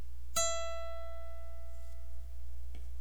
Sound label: guitar high e